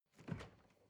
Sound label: refrigerator door open squeak soft